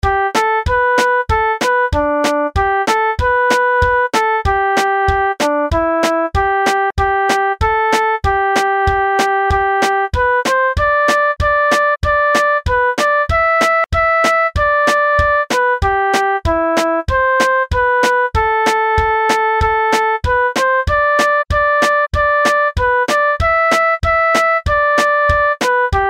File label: March with moderate rhythm